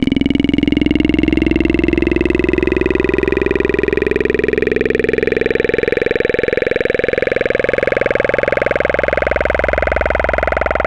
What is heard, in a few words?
Digital Roboto; Synthetic's Serum Collection; ESW Core Wavetables; Echo Sound Works Core Tables